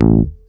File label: BEL; USB Soundscan vol.32; Roots Disco; AKAI